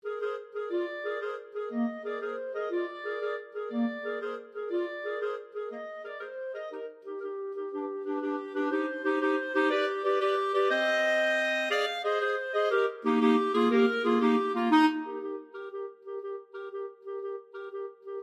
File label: clarinet trio